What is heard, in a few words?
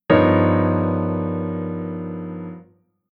Chord Tension Dominant V7 9 11 b13